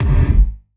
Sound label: Kick, Gated Reverb; creative gate; musicradar